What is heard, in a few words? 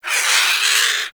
Avichaea Vocal Death; Hunting Critters